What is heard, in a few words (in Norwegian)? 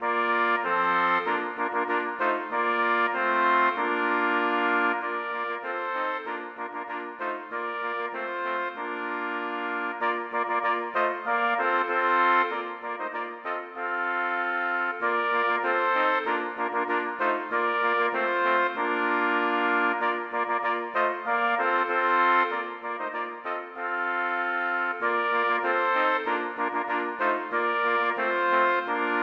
For fire trompeter